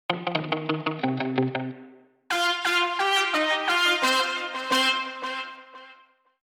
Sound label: Music Reggae